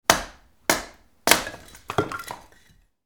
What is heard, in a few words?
wood chop axe hit; nature